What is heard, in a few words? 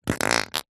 cartoon fart loud wet rip